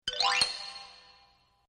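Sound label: xylophone melody fast slide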